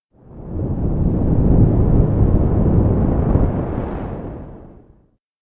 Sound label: elec black wind; anemoi